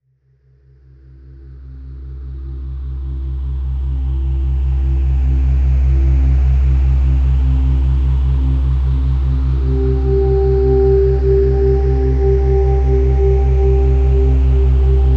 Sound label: Hypnotica Theta, binaural music